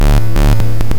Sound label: Double Reverbed Alert